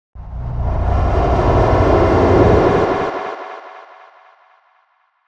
Swept Noise

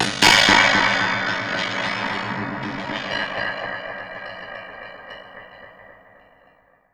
Ring Mod & Delay, contact mic Cymbal

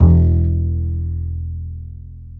E 2, Bass; Spectrasonics; Bass Legends

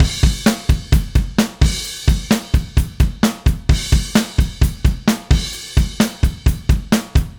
Drums, Indie Rock; Sonic Foundry (Sony Creative Software); Indie Rock Anthems; Session 10 - Roswell

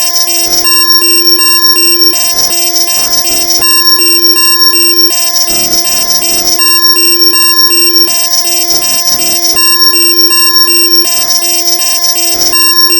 NOISE, Synth FX Misc; E-MU Producer Series Vol. 8 – Platinum Phatt

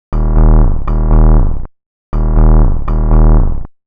120 Bass; Zero-G; Soundclash Nu Dance Electro; Combi Loops; 120bpm; Nu Rhythmix

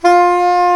SAX SOP MF F, Soprano Sh

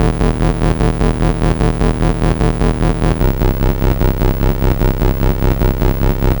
Atari 2600 Bass, chiptune

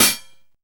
HAT SOFT OPN, VINTAGE FUNK; Zero-G; Funky Elements

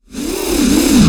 REV, SOUND EFFECT REVERSE

